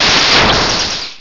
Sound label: Bolt Strike; pokerogue; battle anims